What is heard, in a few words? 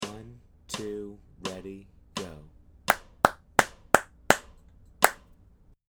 rhythm practice